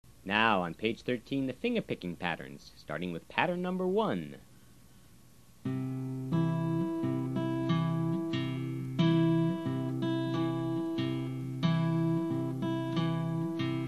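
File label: Pattern 1 C, finger picking guitar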